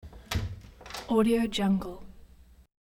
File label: door open clunk on latch; hollywoodedge